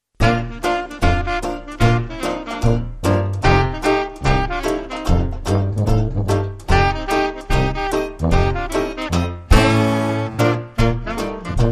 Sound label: West Coast Swing, Theme Only